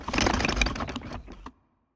Tank Motor

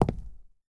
Footsteps Concrete Shoes; starbites; Oneshot

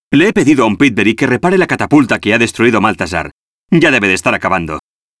Bonus; arthur and the revenge of maltazard; wii

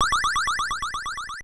Game Start; Joust; Atari 7800

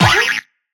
Flick It; BopIt SFX FlickIt Remaster 2

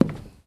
Wood footstep; starbites

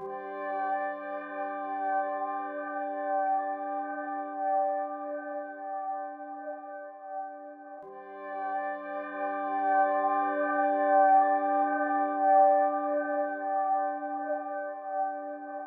Sad motive

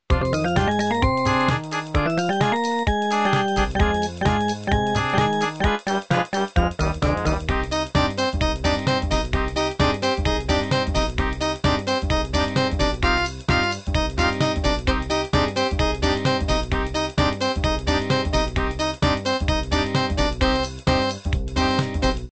Ring Tone (Poly); Smeshariki Kaleidoscope of Games

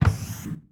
Door

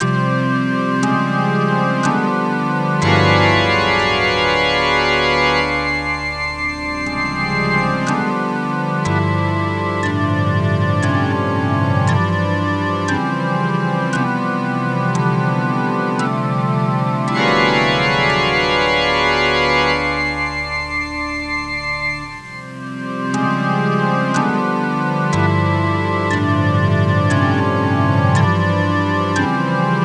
Organ

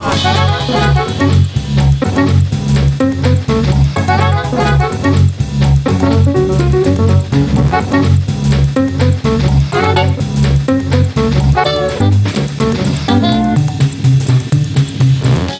Chorus Loop part 2 32 beats

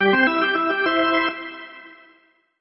Music Frontend

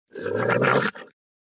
Pre Attack

